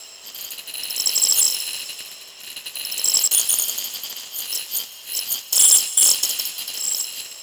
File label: Ambient Tech Drone; Warframe; Tau; Ambience